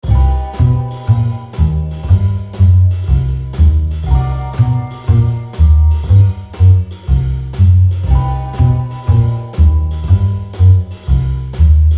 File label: funny cartoon playful mysterious music